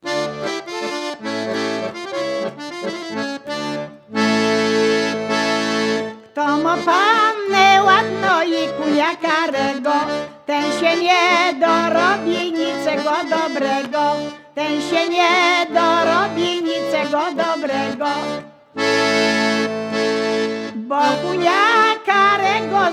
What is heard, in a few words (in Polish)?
śpiewa akordeon; Kto mo panne ladna; z Lomna